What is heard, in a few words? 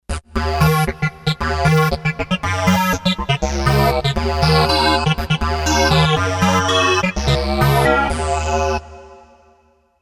FuseBurn, Kawai K5000S